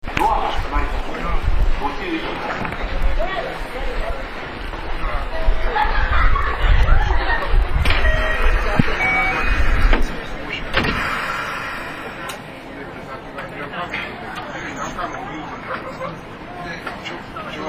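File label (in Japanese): ドア閉め, 225系 0番台, ドア閉め時に減圧機構が働く; 通路上のLCDモニタ、黄色い手すり・つり革、大きいトイレが目を引く車両。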